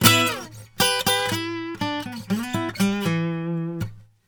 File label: Blues Guitar; ILIO; Fretworks; LICK